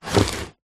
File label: household furniture wooden drawer open plastic packet inside; zapsplat